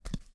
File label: Walk; portal quest android